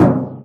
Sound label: metal box impact soft